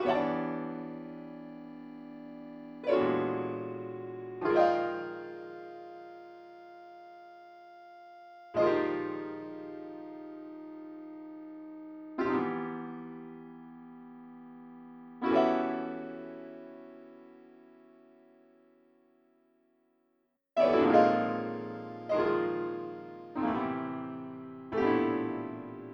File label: piano